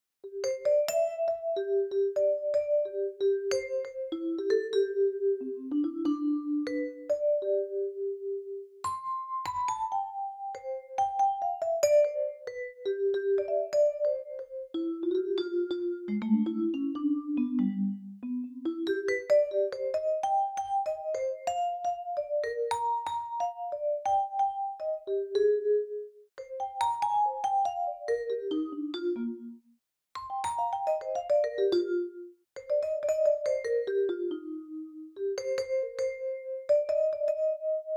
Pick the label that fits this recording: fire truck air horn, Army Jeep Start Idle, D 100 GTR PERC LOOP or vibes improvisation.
vibes improvisation